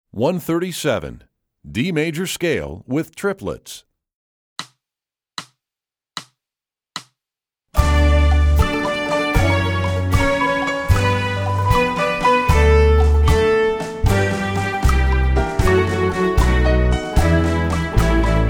D Major Scale With Triplets, Strings; EE2000 Book2

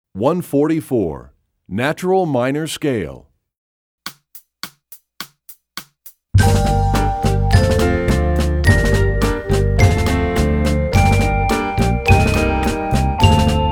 Natural Minor Scale, Percussion; EE2000 Book2

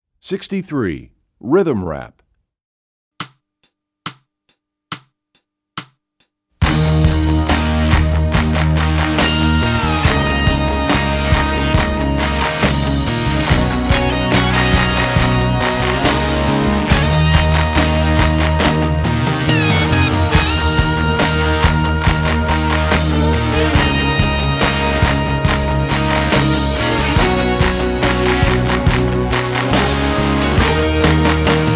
Rhythm Rap, Percussion; EE2000 Book2

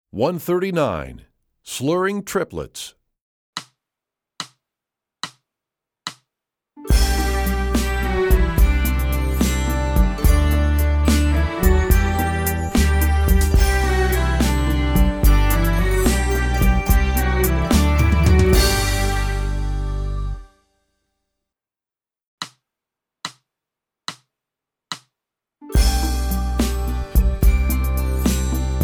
Slurring Triplets, strings; EE2000 Book2

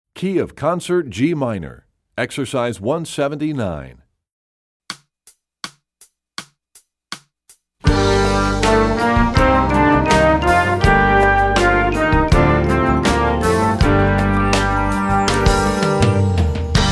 Studies Concert G Minor; EE2000 Book2; Rubank

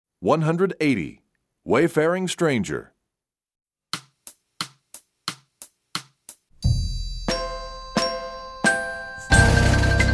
Percussion; Wayfaring; EE2000 Book1

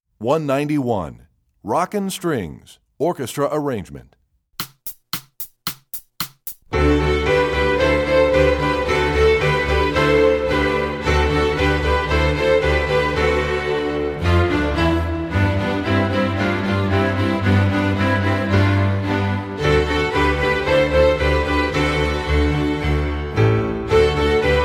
Rockin Strings; EE2000 Book1

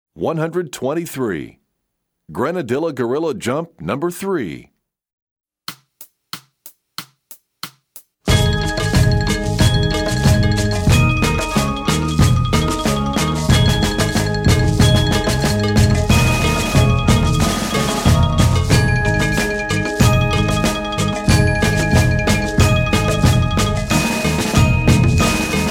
Percussion; EE2000 Book1

